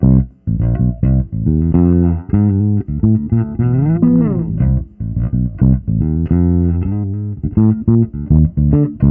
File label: Electric Bass; Basses